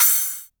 crash, Korg Electribe ER-1; Kit 21

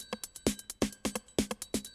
130, Classic DrumLoops

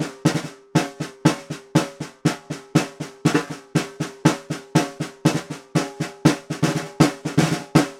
Vintage Ludwig Snare, Percussion; Continental Drift Aftershocks

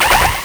LSDj, Nintendo Gameboy; 300 Drum Machines

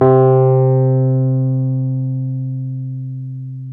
Wurly, Keyboards of The 60's and 70's

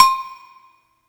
Agogo L, Yamaha PSR E305

